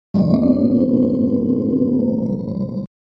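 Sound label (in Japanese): 叫び声（ウオー）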